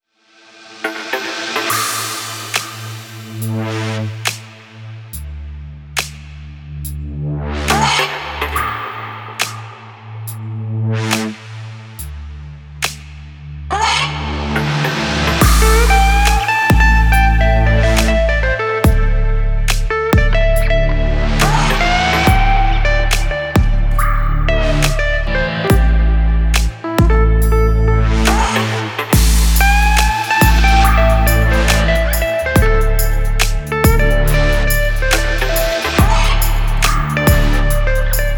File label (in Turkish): striptiz show; By Burak Sound Bank; striptiz tema vol 1